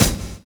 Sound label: OPEN FIRE; Univers Sons - Kitch 70s